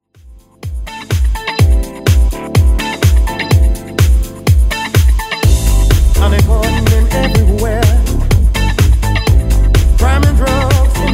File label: 90s Redrum 125bpm